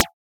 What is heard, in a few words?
Alien FM Perc Short